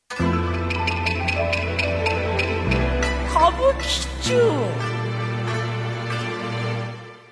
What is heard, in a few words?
jingle; kabuki tune